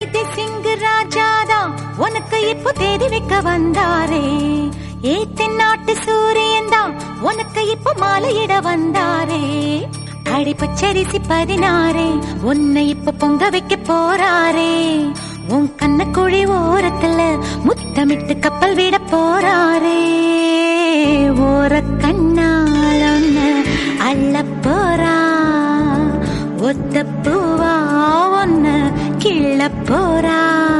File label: Tamil; Thavasi